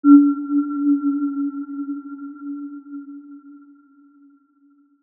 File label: Aurora E4 mf, healing soundscapes; HSS-Custom-1